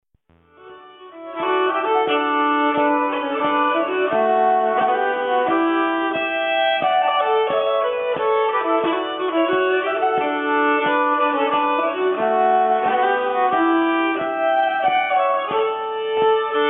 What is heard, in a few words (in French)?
Polska A Deux, nyckelharpa